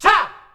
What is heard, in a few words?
"tah", shouts; Best Service; Hyper Dance